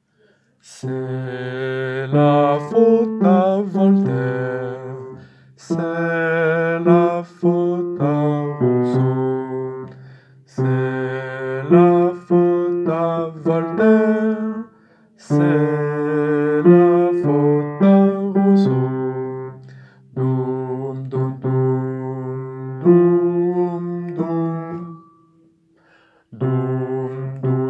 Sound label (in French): basse